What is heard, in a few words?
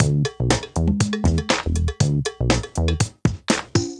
Loading Loop; de blob 2